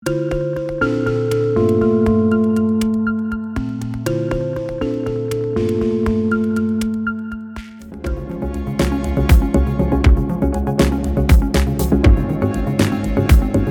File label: Minimal Synth